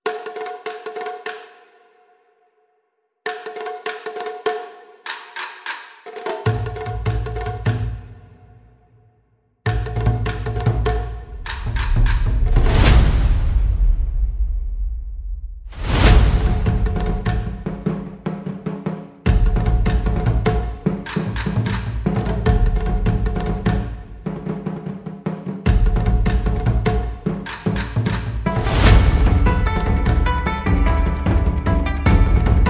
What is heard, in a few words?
No Woodwinds